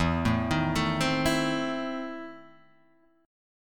E Major 7th Suspended 4th Sharp 5th, Guitar, Drop D